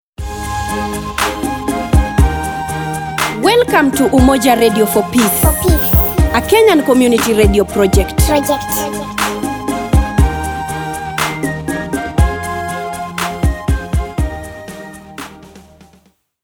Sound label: Jingle; Mtaani FM